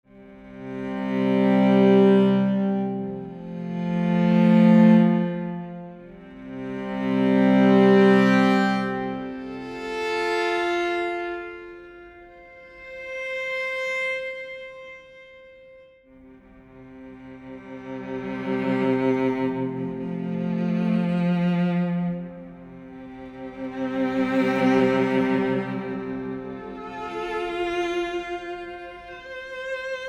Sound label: Cello swells